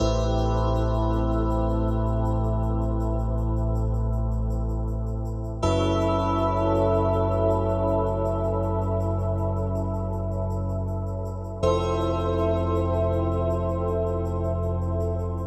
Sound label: game over